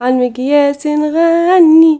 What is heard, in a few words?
GIRL, GIRLVOICE, Arabian Traditions